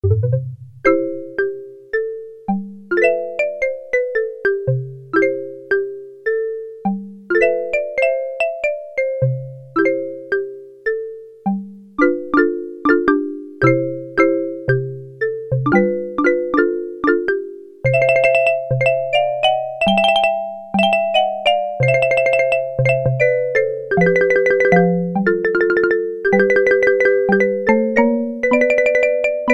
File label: Malleted Marimba; Sequential Circuits Prophet-5 (Ver.3); Factory Patch; File 3 Programs